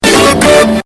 duh dun dun

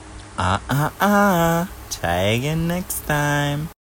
ah ah ah

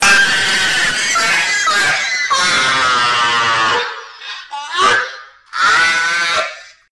laugh no pitch remake; discord

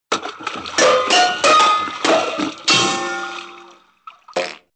whacking fish with pole

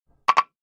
door jammed; fnaf1

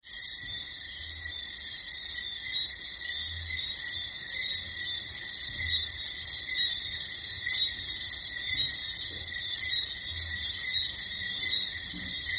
cricket; cricket sfx

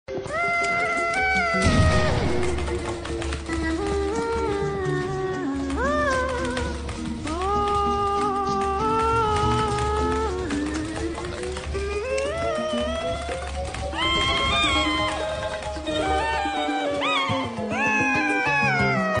distorted; coral cruiser

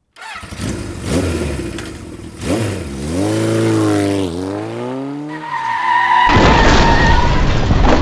motocicle

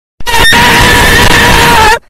screen scream; amogus

